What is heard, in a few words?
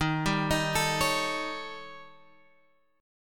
D sharp 7th Flat 5th, D sharp, down, Guitar, Open G